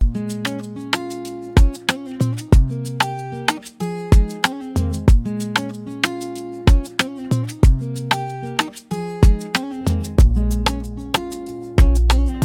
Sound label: beat